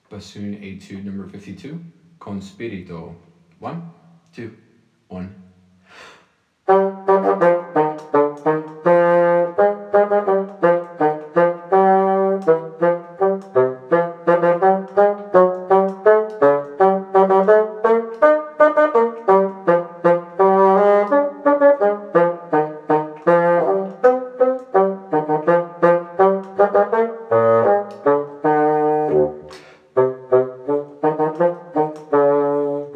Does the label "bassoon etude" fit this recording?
Yes